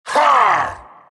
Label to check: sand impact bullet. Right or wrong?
Wrong